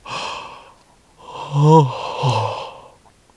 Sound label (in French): baillement